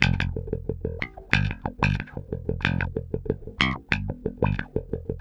F-G, Bass, 90 BPM; Sampleheads; Ultra Freakin' Bass

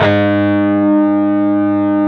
PRS PWR A3E, Guitars; PRS PWR CUTS

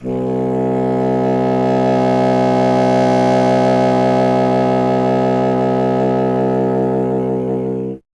C. Bassoon, solo instruments

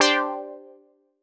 Quart Stab D; PowerFX - Nu Jazz House

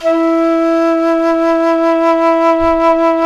Jazz Flute

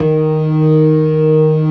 TARK, organ combos; Keith Emerson Signature Series

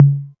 808 Tom Mid

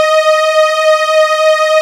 PAD WARM, Nord; Wizoo - Powered Nord; LEAD PAD HRN

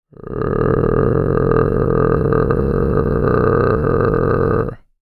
GROWL, VOCAL FX; Spectrasonics Vocal Planet; Jazz FX

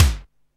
Kick, Alesis Hr16, Drums

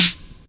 SNARE GAT, snare fx; Big Beat Samples & More; AKAI

